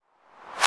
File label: CLP rev, EMU Orbit-3 drum machine; 300 Drum Machines